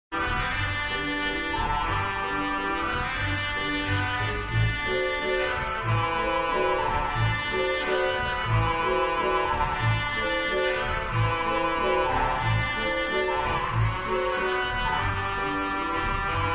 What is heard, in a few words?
theatre organ